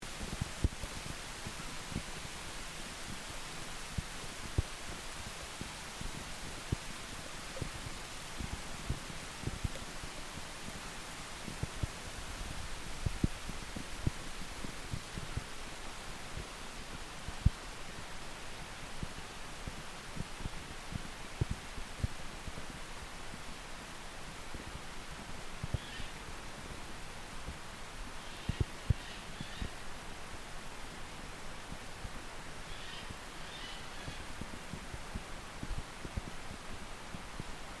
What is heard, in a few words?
Snow falling on river and sound recorder; Snow falling on recorder and into a small stream, Devon, England, UK in November 2024; Here is a recording of snow falling on the recorder and into a small stream that feeds into the River Dart; Meg Brooks